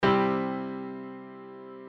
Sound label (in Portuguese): Acorde Maior